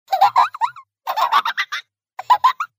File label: laugh delighted giggle child female pitch